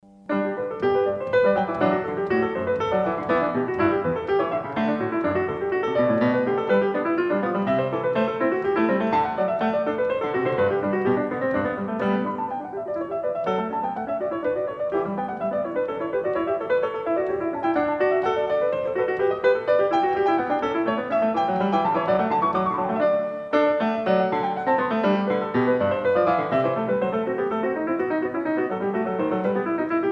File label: Bach Goldberg Variations